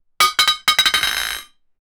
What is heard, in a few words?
crowbar dropping on metal